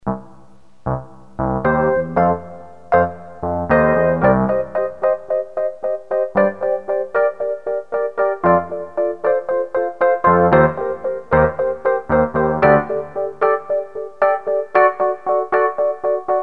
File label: El. Piano; Generalmusic pRP8; Preset demos